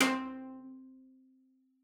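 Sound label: CELLO spiccato C5; 90 s Sample CDs; Xsample VOL-5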